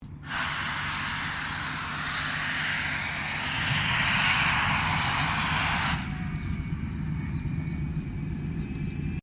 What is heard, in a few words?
AIRCRAFT CA-27 SABRE STARTUP DISTANT; CA-27/F-86 Sabre; Airplanes; Vehicles